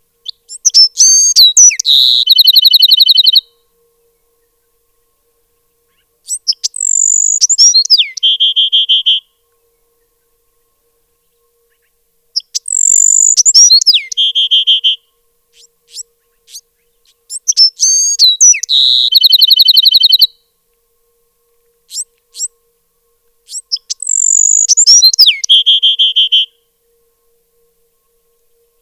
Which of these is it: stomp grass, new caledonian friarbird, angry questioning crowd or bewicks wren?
bewicks wren